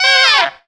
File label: SWEET FALL, FUNKY SAX; Zero-G; Funky Elements